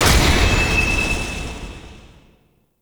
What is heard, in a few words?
Drone Destroy; Warframe; Veilbreaker; Venkra